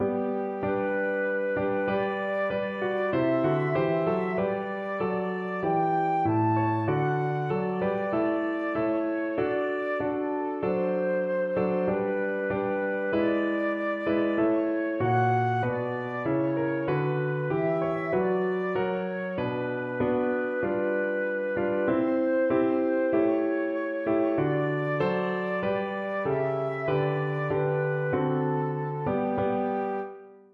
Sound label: Hymn flute